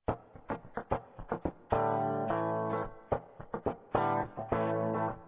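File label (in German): muffled, Gitarrensounds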